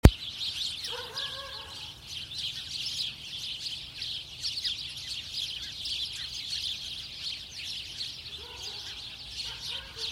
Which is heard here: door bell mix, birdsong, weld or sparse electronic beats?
birdsong